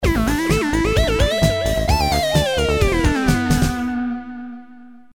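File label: portamento melody; nord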